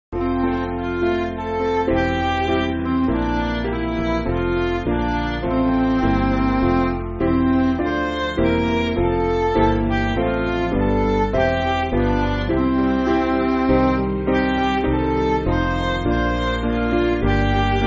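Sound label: Midi, Backing Files for Churches; All Praise To Thee; Engelberg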